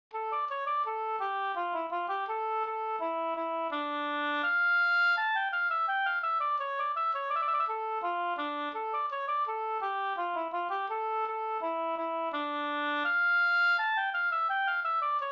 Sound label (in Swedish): polska D moll